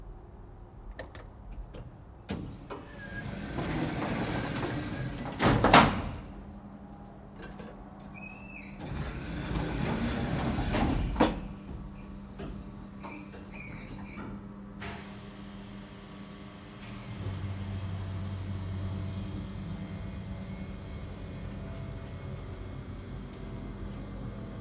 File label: hydr elevator ride